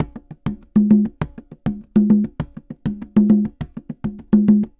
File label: Congas Bomba 100, Drums & Percussion, 100 bpm; Best Service; Festa Latina VOL-1